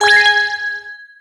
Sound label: surprise